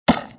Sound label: Ice Asteroid Collision; Angry Birds Space